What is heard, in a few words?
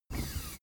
office chair hydraulics up smooth